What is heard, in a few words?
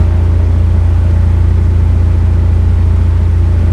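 zlin cockpit right, engines; War Thunder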